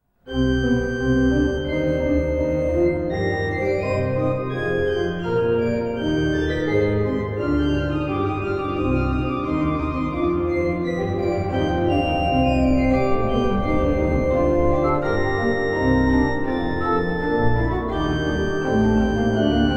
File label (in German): Triosonate II Allegro